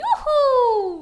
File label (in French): saut skieur feminin